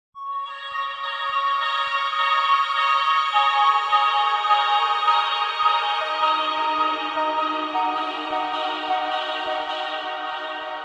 musical heavely euphoria happy dreamy swell; zapsplat

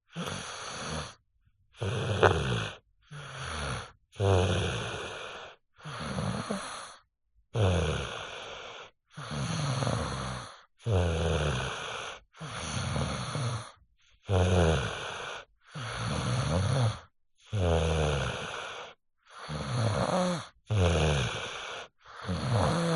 human child snoring 5 yo male deep sleep; zapsplat

